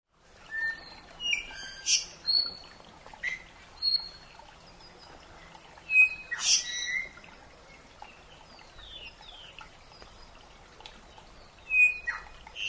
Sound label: Iiwi; Iiwi pu Halep